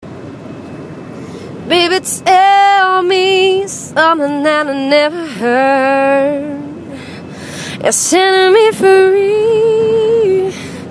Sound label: Singer; Sacramento